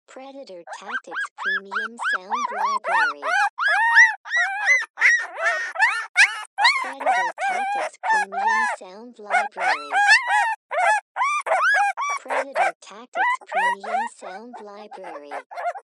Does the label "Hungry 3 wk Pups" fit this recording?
Yes